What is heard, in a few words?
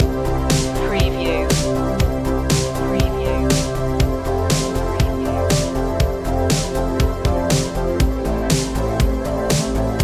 synth wave loop